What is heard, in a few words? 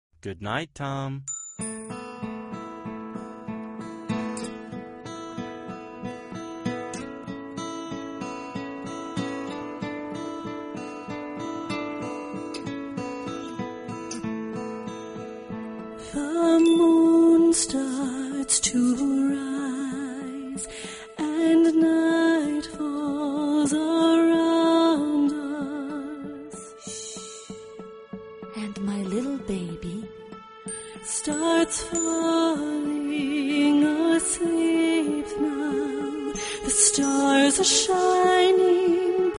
tom